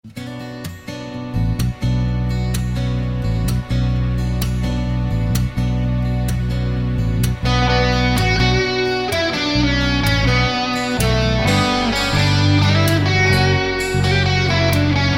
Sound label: God Rest Ye Merry Gentlemen instrumental